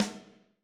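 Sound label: Med Piccolo Room, Alesis SR16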